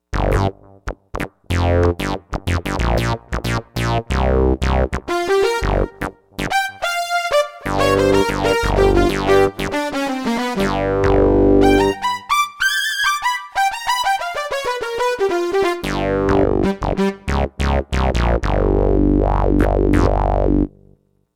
Carillon Abyss

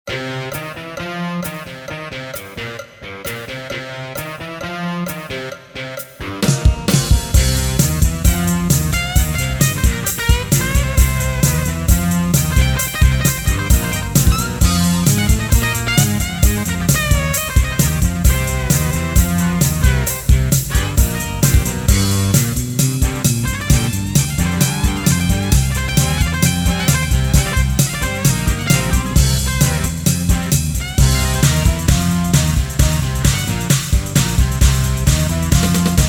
Casio CTK-601, Rhythms, Riff Rock

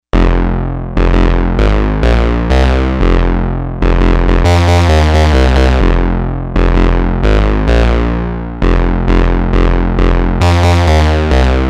BASS II ROGUE POWERBASS

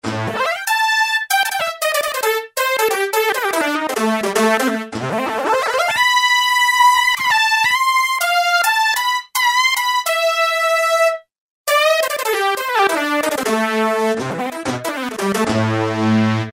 Bongo synth

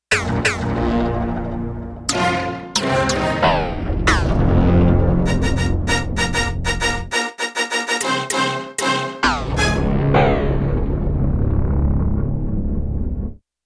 Gated FX; Digital Synthesizer

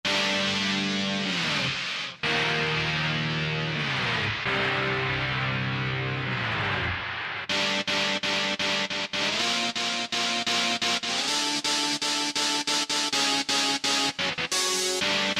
Dist Hit; Roland SRX-05 Supreme Dance

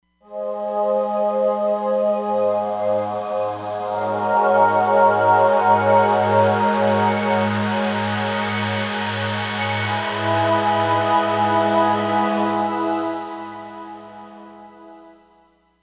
Lost Voices; Roland Fantom XR

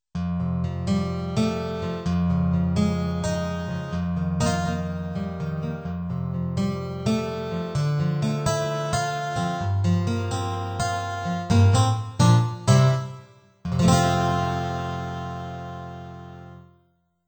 steel guitar, Yamaha PSR-530

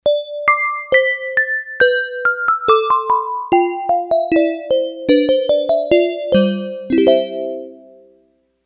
Sy.Perc., Yamaha DX11; Factory Preset Voices

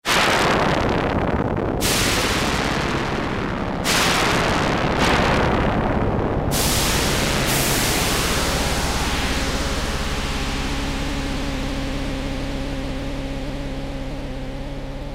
Storm Wind, Yamaha TX81Z

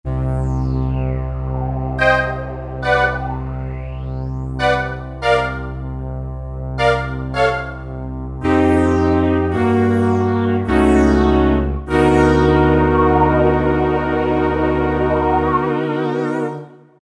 Halo Pad, Roland SC-50 Sound Canvas; Factory sounds

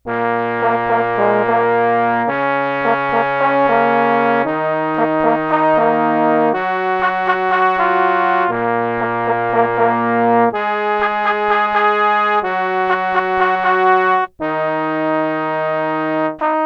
trombones trumpet

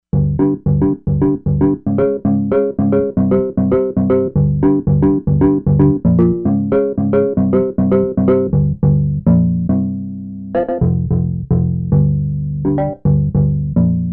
Octaver, Korg MSC-07S Synth

